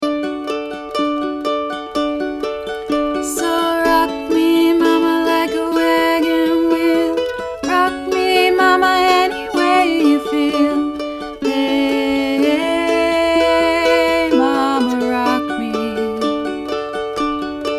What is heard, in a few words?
chorus high harmony